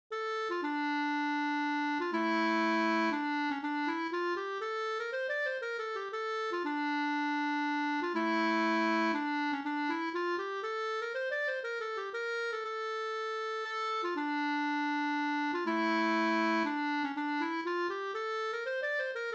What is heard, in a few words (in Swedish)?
polska kringpolska; Per Jonas Lang Havero